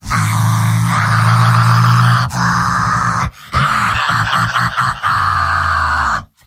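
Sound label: yell, robot; heavy; mvm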